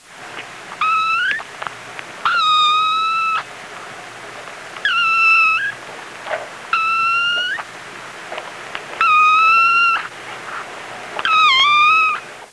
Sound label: BABY KIT, kitten meows